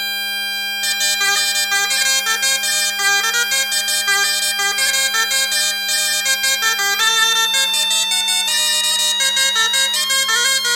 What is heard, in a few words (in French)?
Plinn, biniou bombarde; Plinn - Ton Double; Bro Dreger